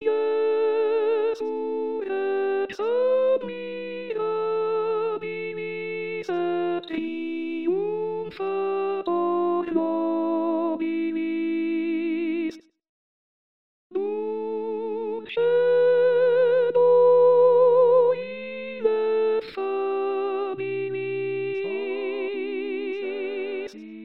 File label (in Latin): Jesu Rex Admirabilis Soprani; Palestrina; anno liturgico